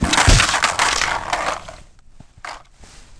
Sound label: bail backward, skater; Tony Hawk's Project 8; XBOX